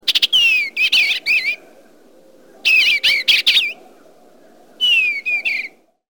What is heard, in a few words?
bluebird